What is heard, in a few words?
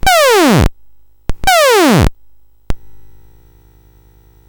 Number Quest BASIC, CSG8580R5; GAMES